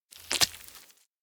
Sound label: dog poop drop; anemoi